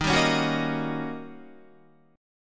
Eb 7th Sharp 9th Eb, Guitar; Eb7#9 strummed; 10,10,13,11,10,11; JGuitar

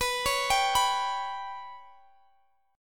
B Suspended 2nd Sharp 5th B, Guitar, Standard; arpeggiated; capo 3; x,x,21,18,20,19

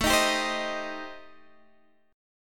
A-Minor 7th Flat 5th, Guitar DADGAD, chord

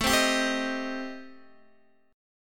A-Minor 7th Flat 5th, Guitar Open D, chord

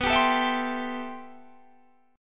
A-Minor 7th B; Am7/B; strummed

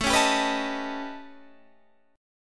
A 9th Flat 5th Guitar Half Step Down, chord

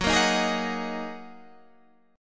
Gb 6th Add 9th Gb down Guitar Standard; strummed; Gb6add9; JGuitar

